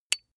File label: small metal impact medium high brillant